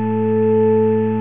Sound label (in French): orgue